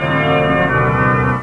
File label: terror organ